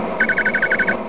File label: Annoying beep (stereo); Hal 9000